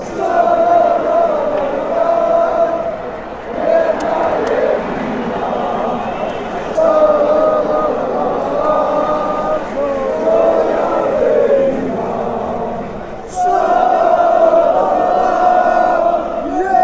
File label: shalalalala, fanchants